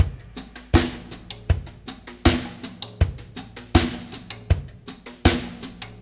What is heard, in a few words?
Russ Loop, Laidback; Sonic Foundry (Sony Creative Software); Loops & Samples Drum Tools